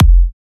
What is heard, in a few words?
Punchy Kick